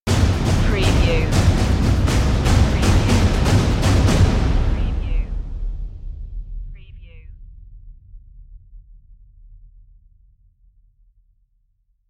perc stinger

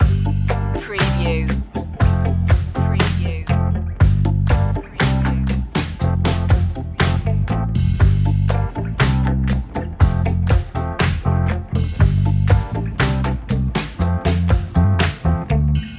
keep calm and groove loop

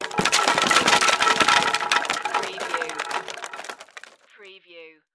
Debris Wood Large

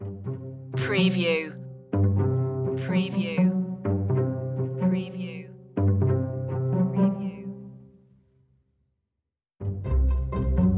no perc

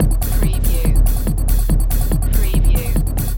Techno-Trance Drum Loop 142 BPM

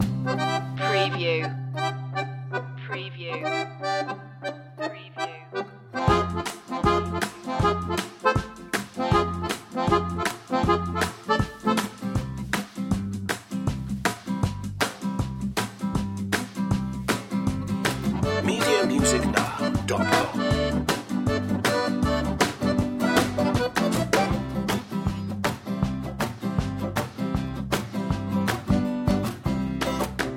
zydeco loop

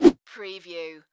Whip Whoosh; Foley; Sound Effects Bible

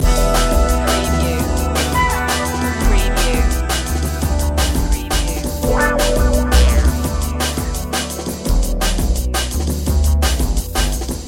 stimulation loop

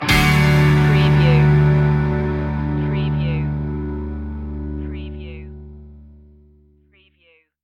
free flowing stinger

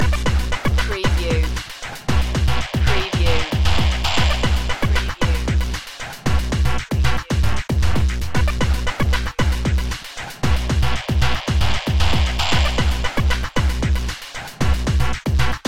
hit me loop

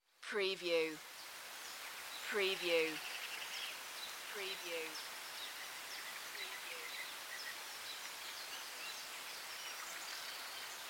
Dawn chorus with light rain in Anglesey Wales; beanstalk audio

